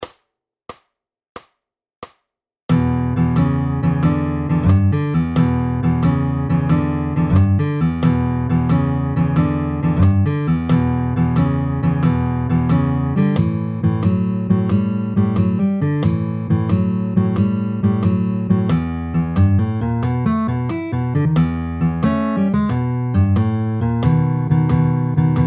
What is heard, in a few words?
Blues; Oreo Cookie